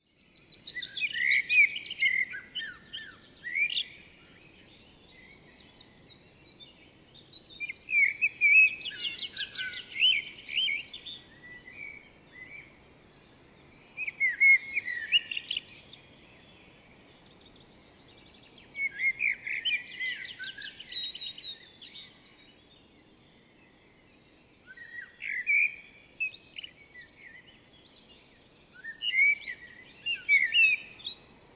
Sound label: Turdus merula; SETE